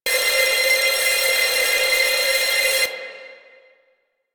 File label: Owl Recon Aim Connect; Duviri